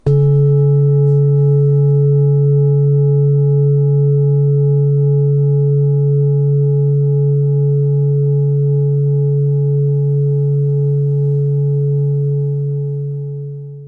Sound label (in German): Klangschale; Pluto Neptun